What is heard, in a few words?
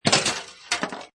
Pinball Sound Ball Launched Slides And Stops; Video Game Sound Effects